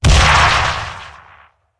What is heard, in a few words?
deployable explode; smite; vulcan